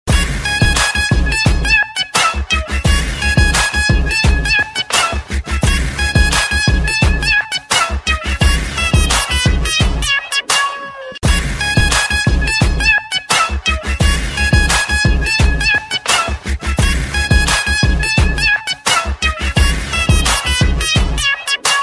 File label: Beatz